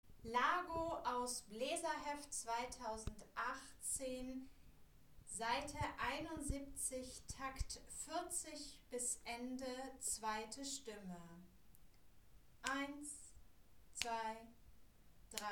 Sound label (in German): Largo Aus der Neuen Welt Stimme 2, Posaunenchor; Dvorak; Takt 40 bis Ende